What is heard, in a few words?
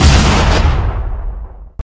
barrel death